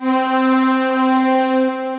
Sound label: Saw Subby Pad; Wavetable